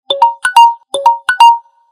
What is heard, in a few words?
Notification Ringtone; Samsung Galaxy Tab A7 10.4